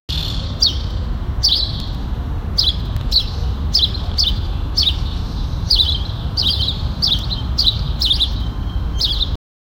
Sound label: a cinematic sound track; afternoon